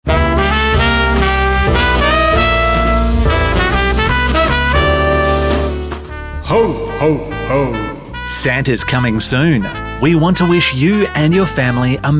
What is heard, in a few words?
Seasonal